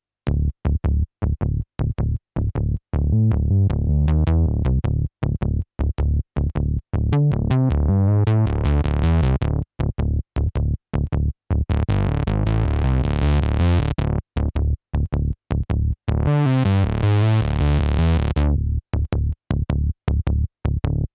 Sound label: Mini V4 Mini Groove Bass; Arturia V Collection X